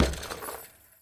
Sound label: Street Ball Glass Basket Chain; SFX Kit; Street Basketball